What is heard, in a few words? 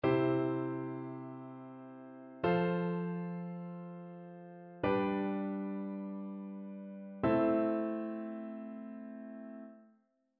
harmonic dictation; aural skills i